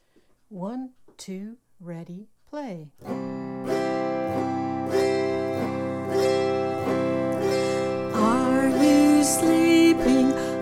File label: Are You Sleeping High Low